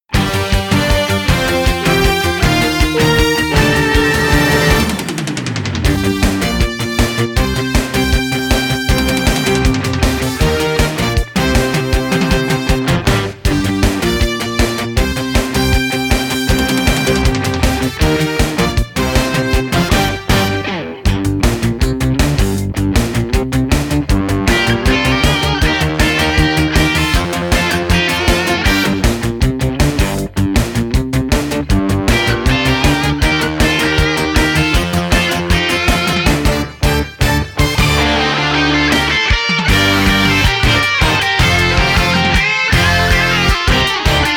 Instrumental Main Title, soundtrack; Saber Rider and the Star Sheriffs

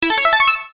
Ding

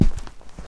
Footsteps Rug; Backrooms 2D

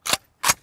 Ak47 Prime; Redmatch 2

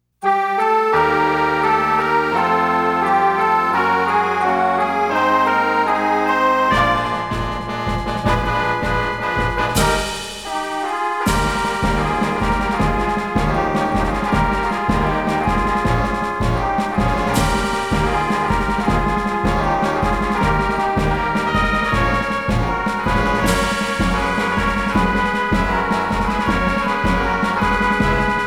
Wind Band; tokimeki memorial forever with you

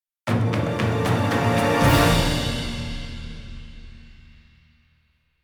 Garden Combat Outro; Skellige; Freyas Garden

